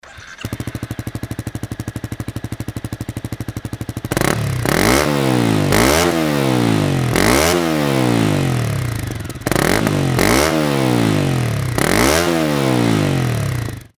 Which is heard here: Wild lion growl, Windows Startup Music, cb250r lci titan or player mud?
cb250r lci titan